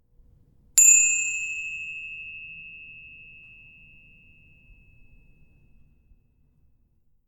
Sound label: Hand Bells